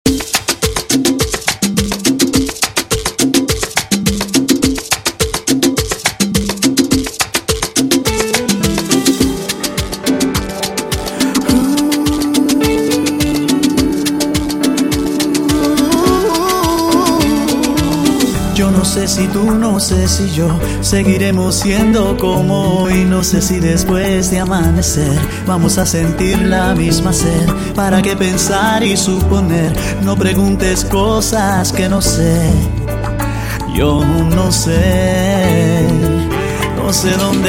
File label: SALSA INTRO BPM 105